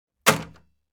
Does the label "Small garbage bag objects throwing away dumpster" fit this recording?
Yes